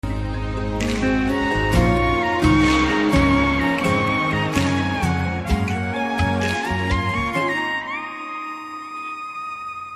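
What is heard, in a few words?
Teaser Love background music Telugu Ringtone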